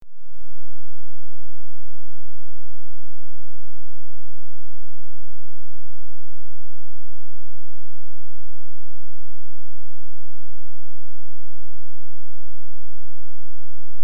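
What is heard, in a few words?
Dining Room Tone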